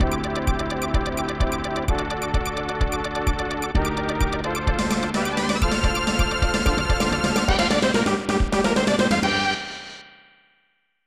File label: Transforming; Shin SD Sengokuden Daishougun Retsuden 1995 SNES